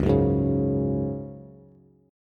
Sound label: Db Suspended 4th Db, Bass; strummed